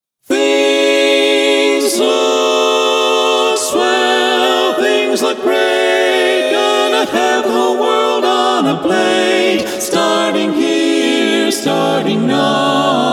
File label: acapp